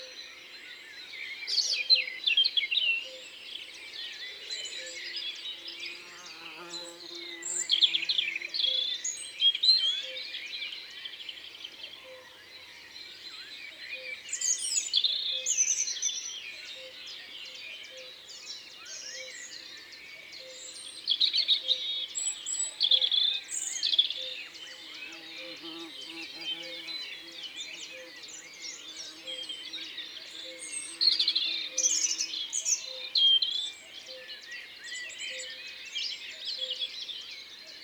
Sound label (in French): Rougegorge familier, Concerts naturels de l'Isère; Studio Les Trois Becs